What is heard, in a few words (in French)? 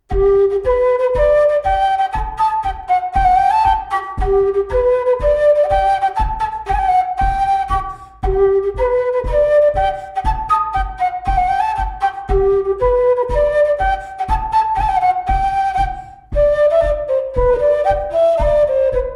Anglaise; Ms Dauternaux